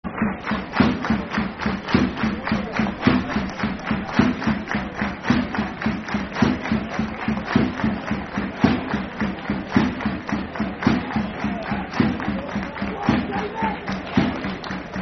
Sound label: Drums Shrewsbury Football Chant (Soccer Song); Shrewsbury Town Stfc